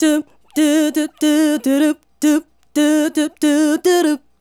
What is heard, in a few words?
Female Solo, Hip-Hop and RnB Vocals